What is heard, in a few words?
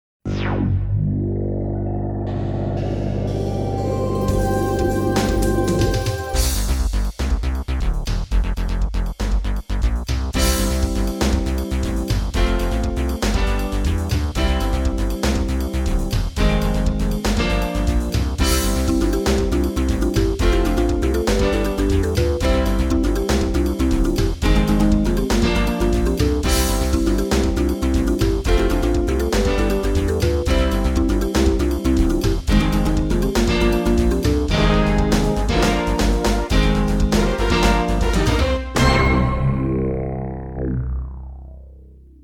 Feedback Solicitation Bed